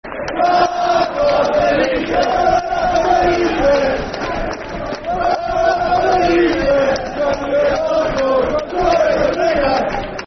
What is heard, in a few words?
Rafa La Bamba Football Chants Liverpool; Liverpool Lfc